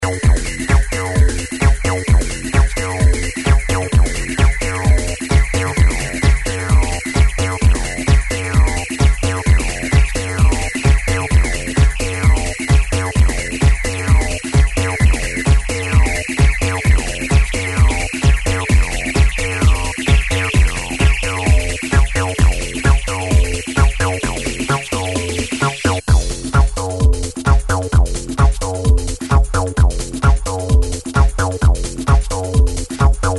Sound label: Acid Dub Interpretation